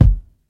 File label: steel kick drum sample f key; kick drum samples